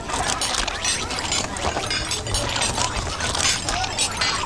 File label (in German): Tisch Ess